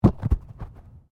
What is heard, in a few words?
Body movement Tumble Medium; Body movement foley to simulate falls and deaths; Rolling